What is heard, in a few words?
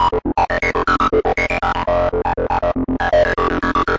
120 funky vox synth rhythm; VOCAL OR VOXY LOOPS